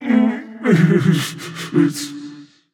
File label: boohoo; smite; voicepack; thanatos